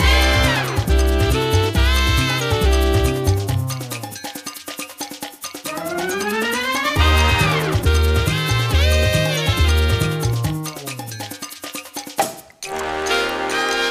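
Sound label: food world music paradise shooter no guitar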